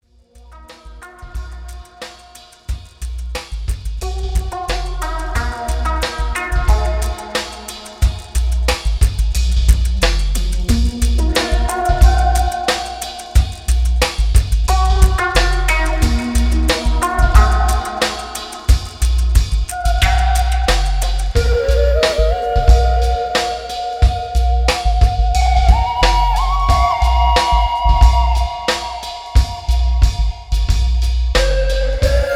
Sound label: DUBSTEP